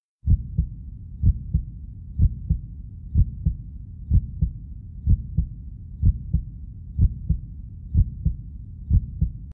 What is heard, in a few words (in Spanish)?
latido corazon